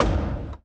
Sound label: riser stop, electromagnetic plant; Factorio